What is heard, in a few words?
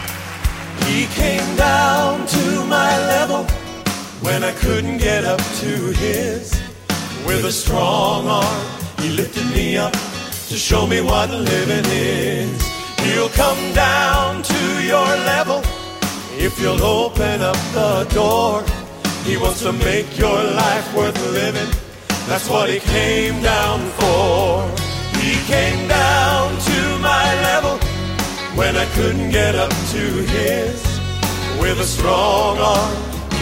Southern Gospel